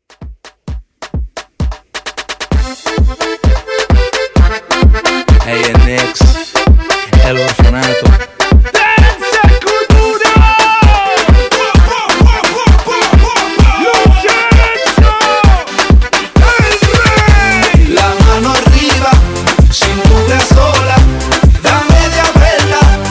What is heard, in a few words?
Afrobeat Redrum